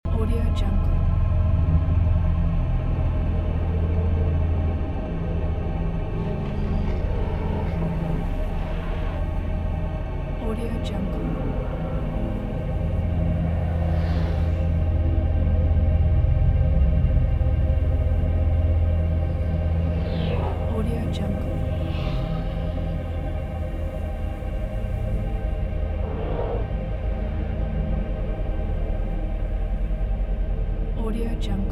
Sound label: horror ambience loop